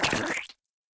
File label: Wall stick Impacto; Super Mombo Quest